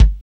KIK OLD BD; AMG; Now CD-ROM (Roland)

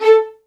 MED ATT A4, soft violins; Q Up Arts; Denny Jaeger Master Violin Library

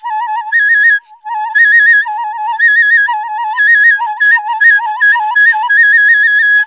INDIA PICC

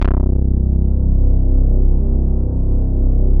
OB LOW PAD

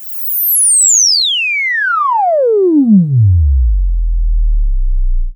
sweep, SCIFI; Techno Trance Essentials

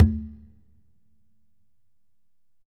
Congas wet, drums percussion

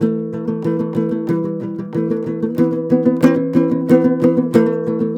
TOPSHUR; Soundscan vol.60; Asian Traditions; AKAI

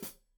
Hat Foot, Hi-Hat, Cymbals Stereo

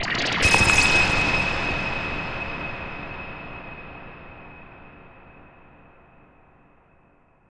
Long Decay, FX; Sonic Foundry (Sony Creative Software); Underground UK House & Electro

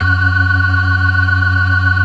FAST VIB, ORGAN, TREMOLO VIB; Keith Emerson Signature Series

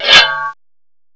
SWORD; M8; CMI Factory

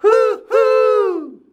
HUHUUUH C, MALES; Best Service; Hyper Dance

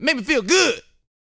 VOCAL, R&B Dance, HIP-HOP

